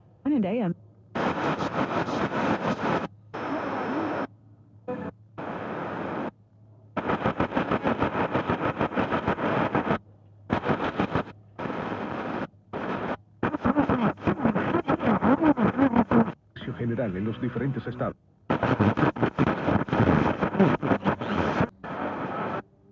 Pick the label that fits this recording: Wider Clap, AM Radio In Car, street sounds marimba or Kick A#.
AM Radio In Car